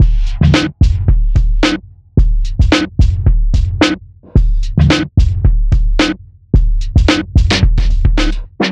junk hip sweep 110bpm

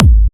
Trance Kicks, Bassdrums

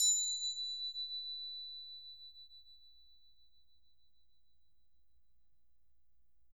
Bell H, BELLS; Roland MV8800

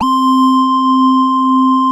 TRI LEAD C4 L, KORG, Analog; USB Soundscan vol.49; Analog Fantasies; AKAI

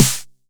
909 SD; Goldbaby Tape808 & Tape909; The Tape 909

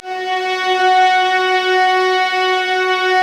Vas Sul Pont; STR Vlas Bow FX

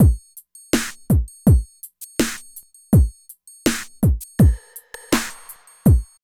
LOOP; East West - Phat & Phunky CD1; 90's Sample CDs

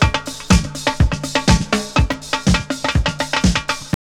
house loop

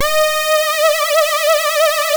Synth and Organ

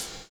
STONESNAR; Univers Sons

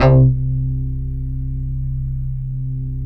BASS, Drum & Bass, 160 BPM; Ueberschall; Drum & Bass Flash Trackz

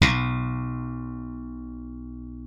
B1, slap thumb; Xsample VOL-1

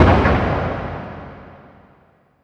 JETTISON, PERC; NorthStar - The Wizard VOL-2; TSAR PERC

